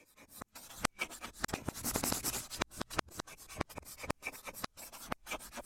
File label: chalkboard